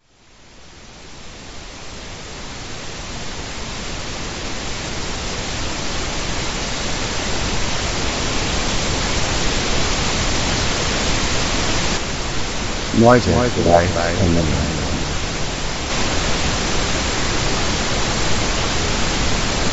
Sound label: pink noise